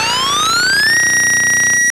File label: SPACE, Tekno Industrial; East West